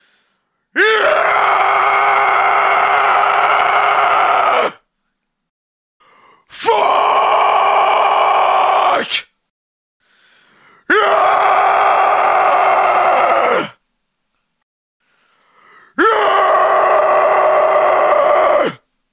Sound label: Pissed Off Screams